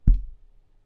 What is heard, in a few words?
collision